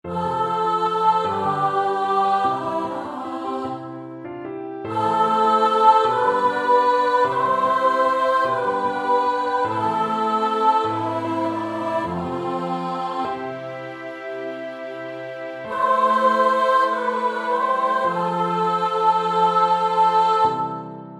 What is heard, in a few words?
Alto